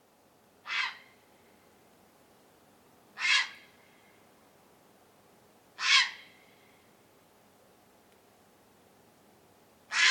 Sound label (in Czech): volavka popelava Ardea cinerea